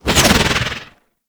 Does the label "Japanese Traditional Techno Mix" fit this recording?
No